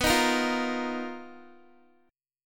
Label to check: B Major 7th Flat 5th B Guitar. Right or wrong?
Right